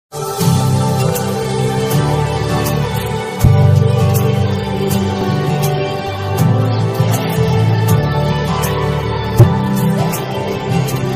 ringtone instrumental ringtone

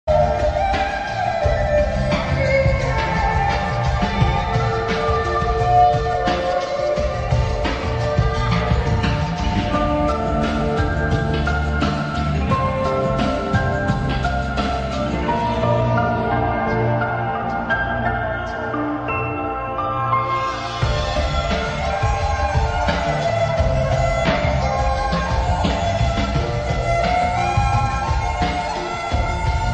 ringtone lofi sad ringtone

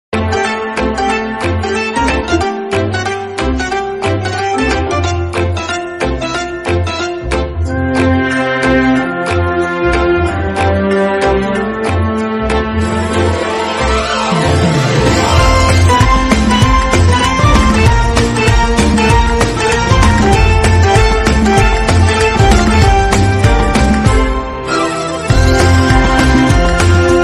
music instrumental ringtone; pk; amir khan; anuskha sharma

